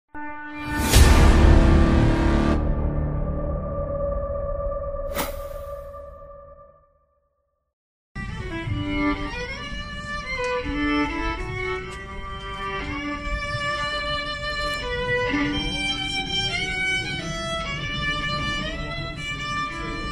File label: plays Waltzing Matilda on violin at prison rally; assange supporter; belmarsh